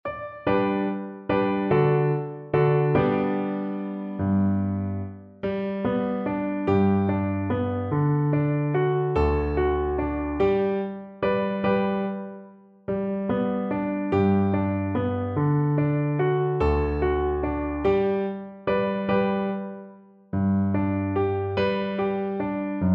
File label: es klappert die muhle viola